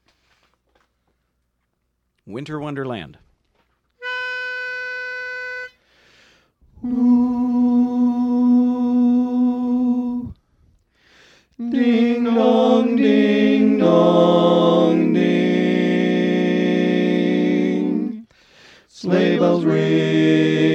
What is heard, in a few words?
bass; Greater Detroit Part Recordings